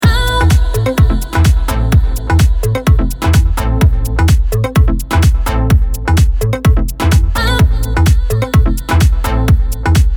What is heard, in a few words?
house Mix